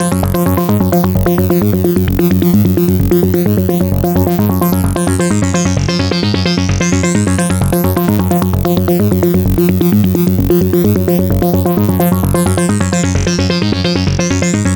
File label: NRG Arp, trance